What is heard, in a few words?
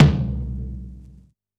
Vinyl Tom